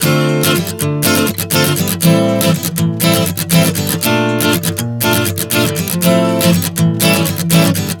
Dm-A-Bb-A7 120 bpm, acoustic guitar chord progressions